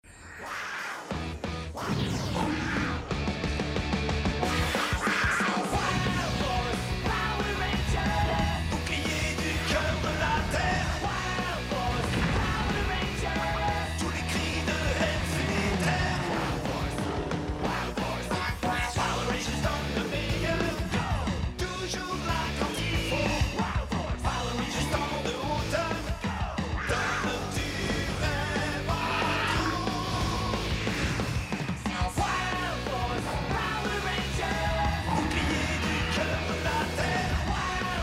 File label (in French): musique generique